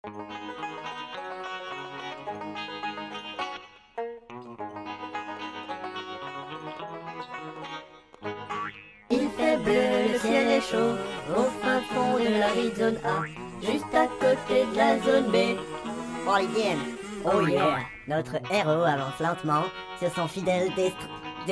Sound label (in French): Chanson au kazoo